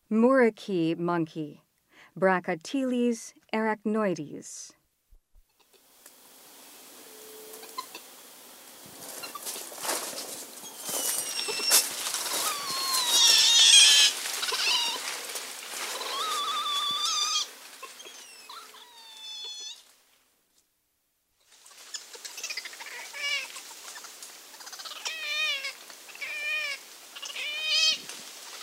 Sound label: Muriqui monkey; S. America; Rainforest